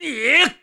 Lift Heavy; Skyward Sword; zelda

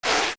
Deku Baba Lunge; zelda; OOT

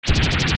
Twinrova Pre Blast; OOT

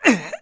Lift Heavy; Link lifting a heavy object

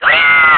Spin Attack; Link's spin-attack shouts

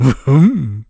Iron Boots; Mayor Bo showing Link the Iron Boots

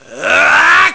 Gauntlet Charge; Byrne charging a gauntlet attack; zelda

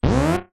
Final Door; Final door to the Triforce opening (2 sounds heard together); LTTP

